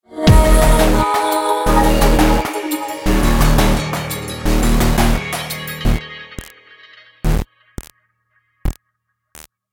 Fight Low Combo; Remember Me

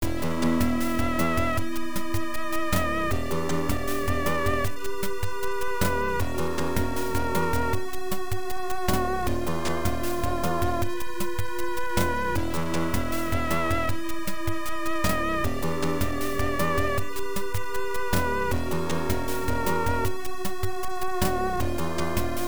Trap; Xak II; MSX2; OPLL

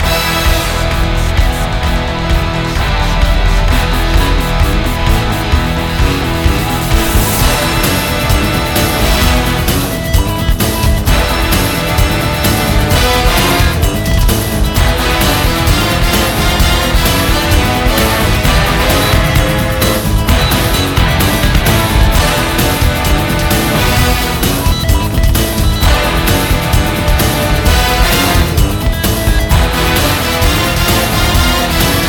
Game Win; Spy Hunter

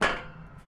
walk metal

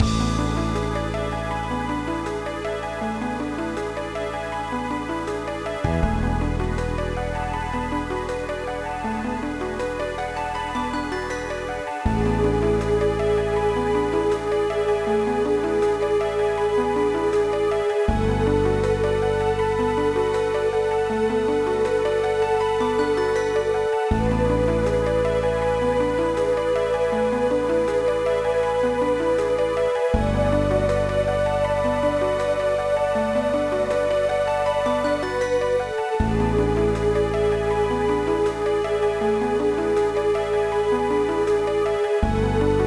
BGM; Kiwame Mahjong Deluxe Mirai Senshi 21; GBA

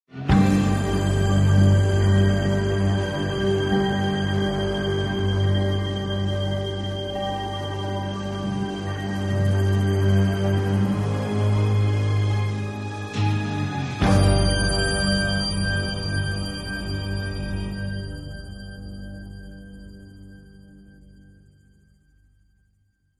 Loop Suspense; tales from the borderlands gamerip 2015

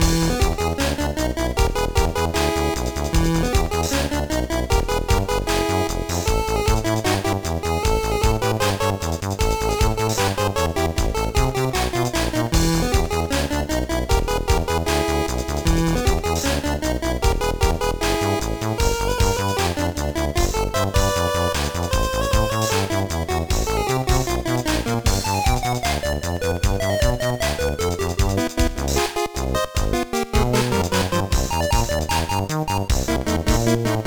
BGM; Shaman King Chou Senjiryakketsu 2; GBA

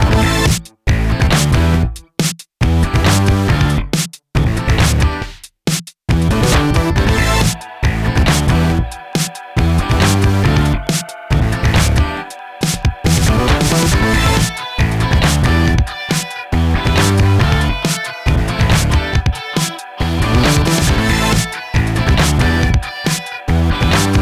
race

